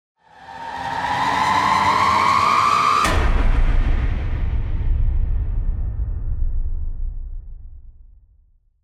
korotkoe narastajushhee naprjazhenie

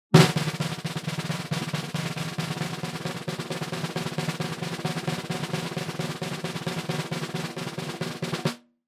drob variant

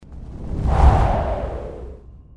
rezkij poryv; Звук ветра; Звук ветра для перехода в видео или для монтажного перехода в аудио монтаже

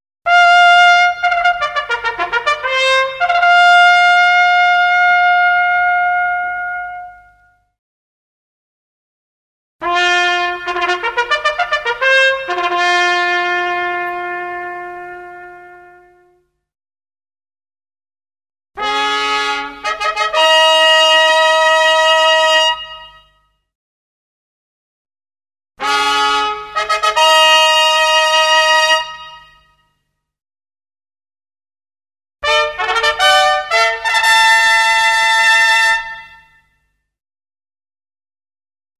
Torzhestvennye; Звук фанфар на начало праздника, на выход ведущих, или перед награждением

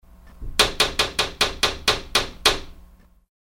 v steklo v dveri

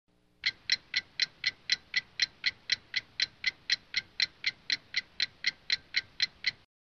tikaet; Тиканье часов

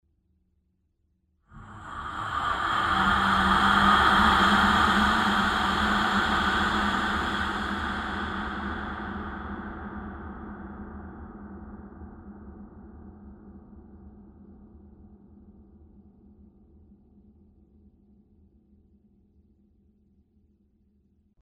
strashnoe blizkoe dyhanie prizraka

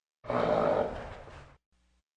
hrap loshad v pomecheniii

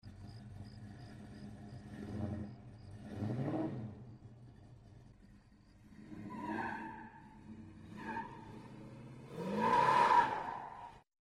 mashina gazuet potom dva trenija shiny o zemlju pri starte

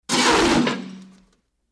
carapajushhij zvuk udara

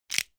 slomali neskolko palcev

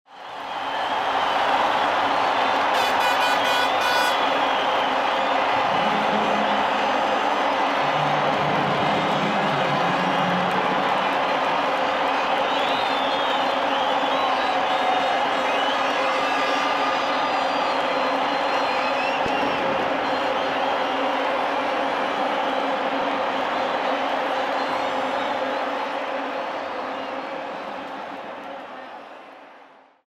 futbol dudki obshhij rovnyj fon

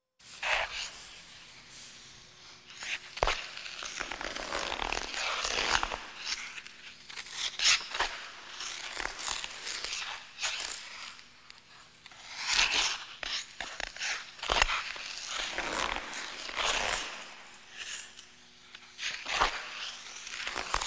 figurnoe katanie odnogo cheloveka; Звуки катания на коньках

